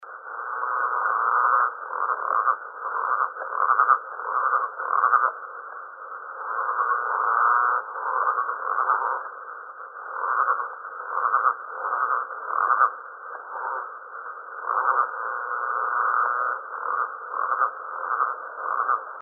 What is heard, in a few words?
growlers